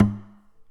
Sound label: Classical Guitar Damped Pluck